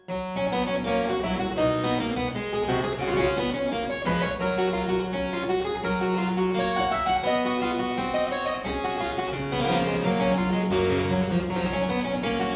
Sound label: Aria in G major Partita 23, Harpsichord